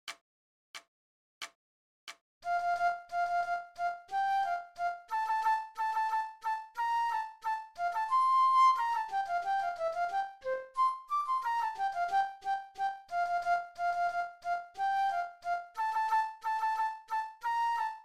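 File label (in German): Floete, 90 bpm; Yorkscher Marsch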